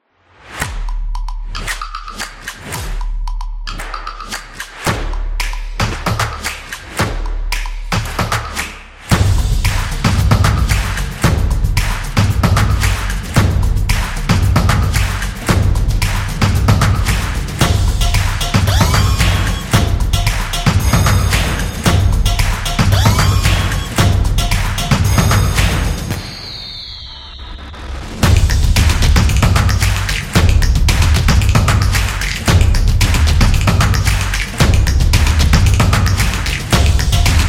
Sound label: Drum It; football promo